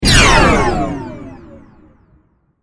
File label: Laser Burst Power, Laser, Burst, Power, Down, Science Fiction